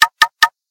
Knock; LG; SU640 Optimus LTE

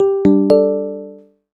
system video calling outgoing; BlackBerry OS-10

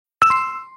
Msg Alert Text MMS, notifications; WindCanada

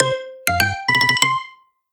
Woodpecker, notifications; LG G4 Stylus

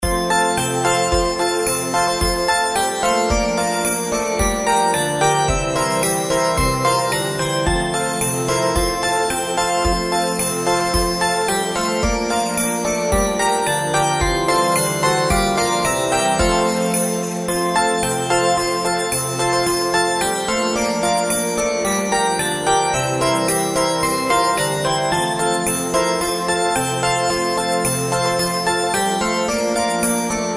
Jingle Bell, ringtones; ZTE Open C

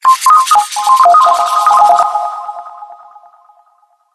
Rain Drops; Karma-QA1

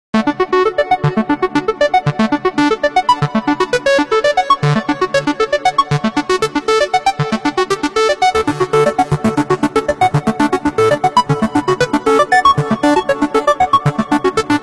Nokia; 701

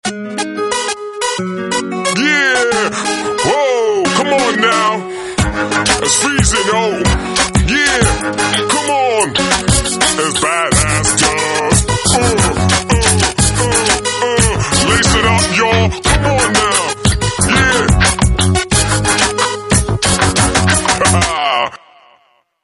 Hip hop guy; Samsung GT-M8800 Pixon

